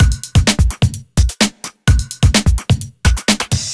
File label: House Beat, 128 BPM